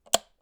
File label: switch, UI Audio; Kenney's Sound Pack